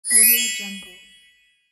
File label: magic